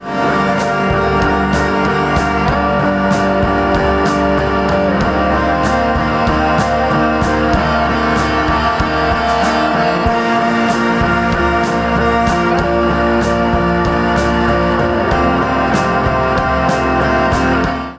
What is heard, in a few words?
Church Melody; church melodies volume 1